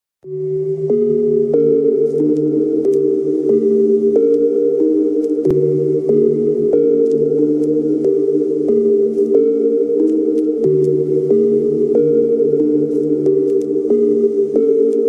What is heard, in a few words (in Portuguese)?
fundo musical suspense terror horror themes